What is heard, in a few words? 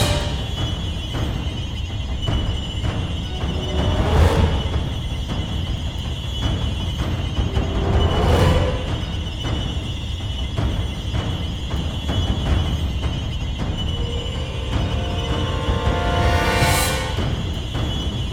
demon loop; Dark Realm Queen of Flames